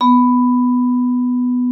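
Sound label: vibraphone H3; Xsample VOL-4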